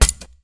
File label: rope launcher